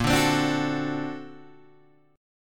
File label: A sharp Augmented Major 9th Guitar Open G